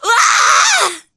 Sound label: woman screaming